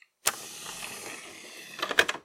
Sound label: close disk tray